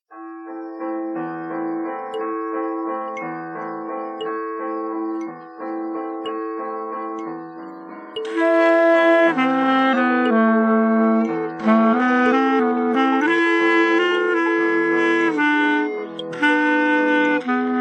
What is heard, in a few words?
valse